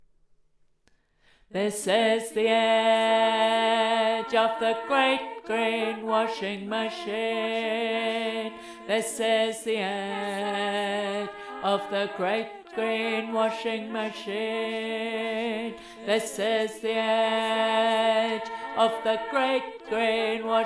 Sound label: great green washing machine low